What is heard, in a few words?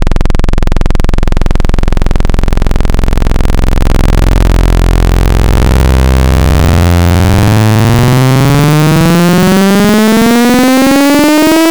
Digital Screamer Saw; Synthetic's Serum Collection; Echo Sound Works Core Tables